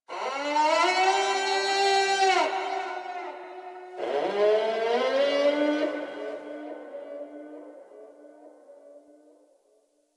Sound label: Distant Creature, Ambience; One Offs; Warframe; Eidolon Plains